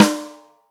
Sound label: ROCK SNARE CHROMATIC, Real Drum Kits